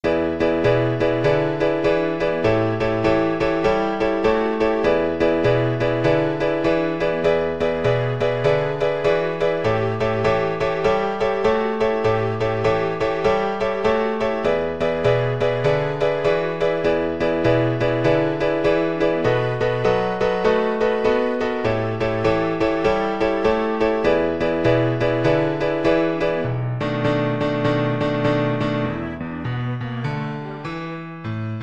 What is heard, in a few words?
style, viola